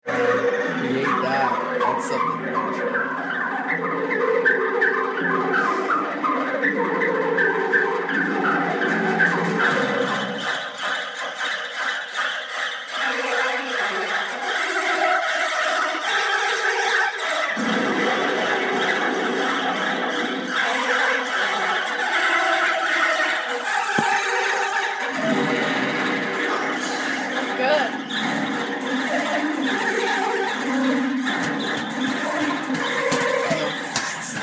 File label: BROKE VHS; Heathers